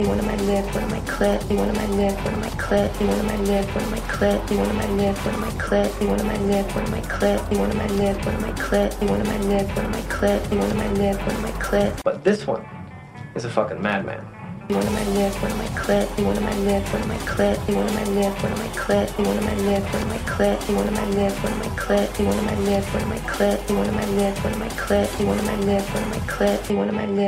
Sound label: EDM; pulp fiction